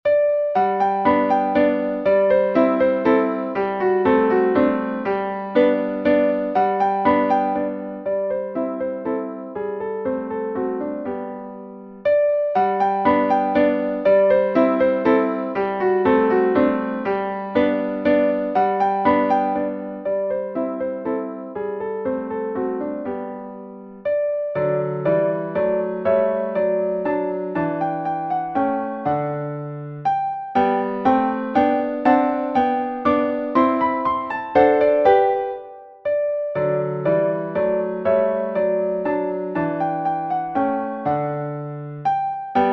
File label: German Dance; Deutscher Tanz